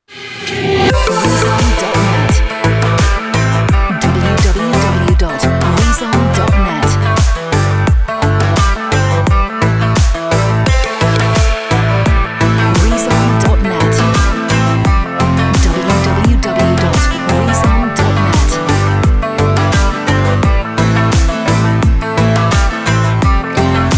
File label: ROCK Dance Pop